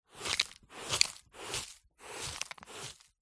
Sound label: sweeping up dirt debris carpet dustpan brush